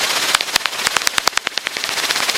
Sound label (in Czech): netopyr jizni, Pipistrellus kuhli